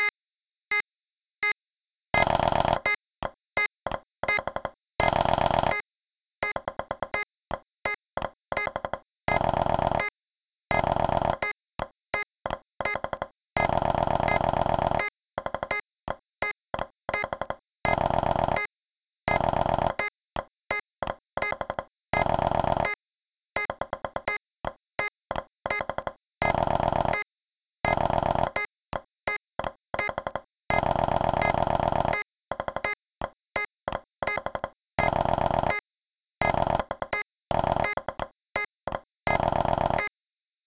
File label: Snare; Castle Dangerous Pad